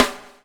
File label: BUBL, BUBBLE; Spectrasonics - Backbeat